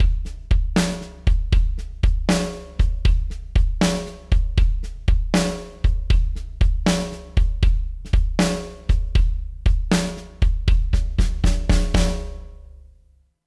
manley core drums wet

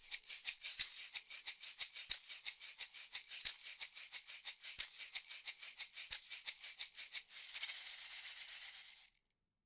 shaker akg c214

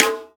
Side Stick; Conundrums

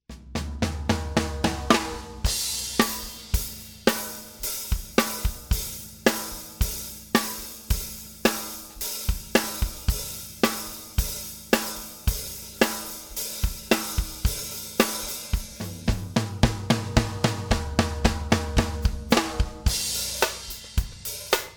alesis compressor 3632

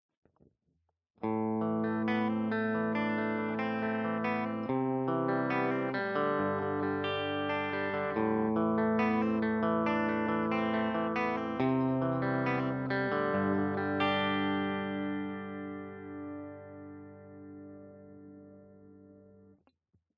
di fender strat; Line 6 Variax JTV-69; 59er

